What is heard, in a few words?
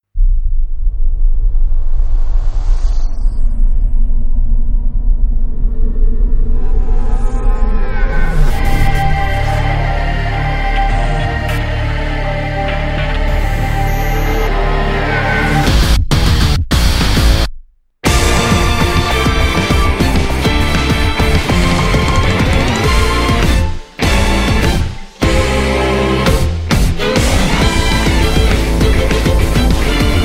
instrumental